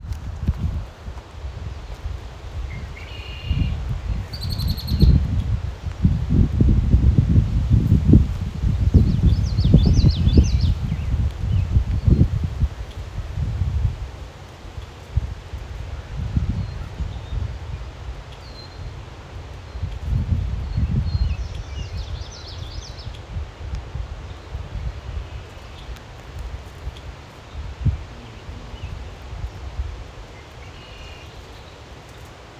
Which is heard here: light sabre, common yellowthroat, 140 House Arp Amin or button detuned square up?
common yellowthroat